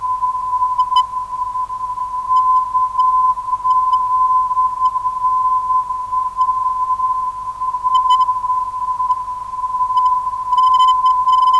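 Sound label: 291kHz, non-directional beacon; KZN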